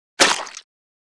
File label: object being squash; fights bodyfalls; Asian Show Sound Effects